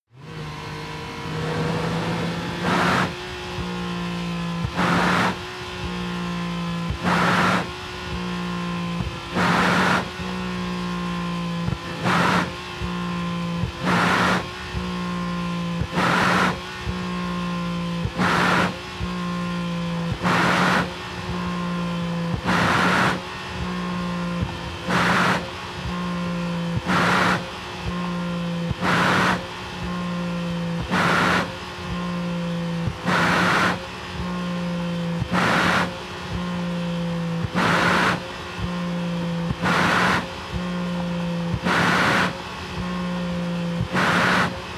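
sports car interior engine short thrusts sequence rpm high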